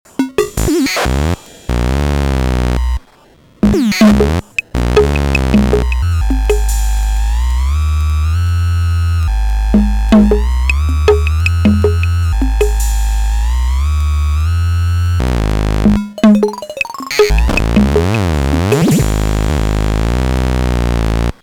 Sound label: TR-808 thru HSS2020